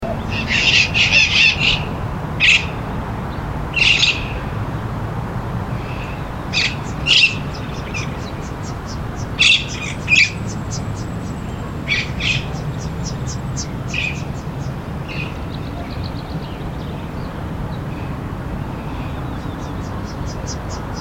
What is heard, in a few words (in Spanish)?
Paisaje Sonoro; MONO